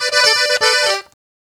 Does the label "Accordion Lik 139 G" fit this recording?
Yes